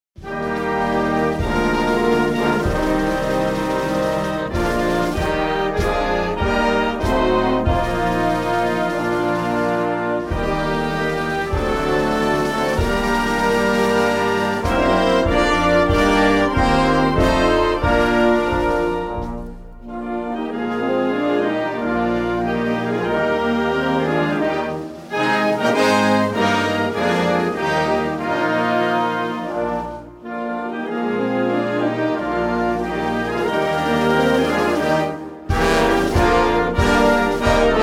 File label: O Canada Pipes and Drums; The Canadian Scottish Regiment Pipes and Drums; The Third Marine Aircraft Wing